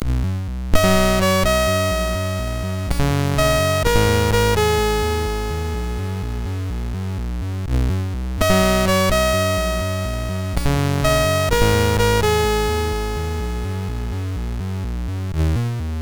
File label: intro, sid MOS6581R2; XLCUS News 5